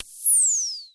Dual-Percussion-Synthesiser; Klone

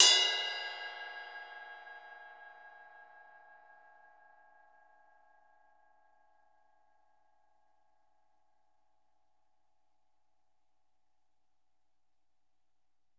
RIDE, Drums Percussion

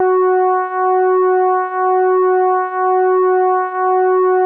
DORGAN F#3, ORGANS; Music Store Sound Service; Einstein

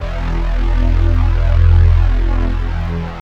pads E; Zero-G; Total Drum Bass

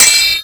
SW HIT, MORESWORD; Total Control - Action Cutz

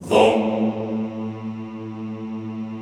Classical Choir Hmmm A1; Best Service; Extended Classical Choir

